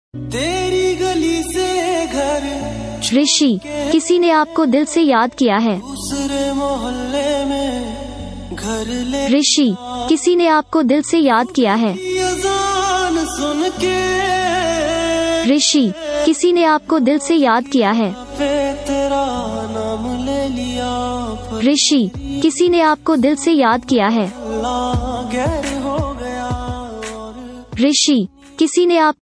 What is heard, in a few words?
ringtone; rishi naam ka ringtone